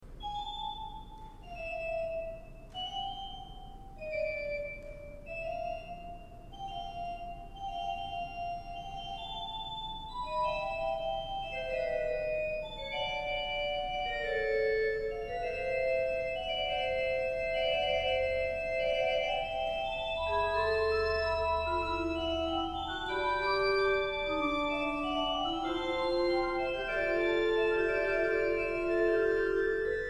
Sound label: Chirping Birds Fugue, organ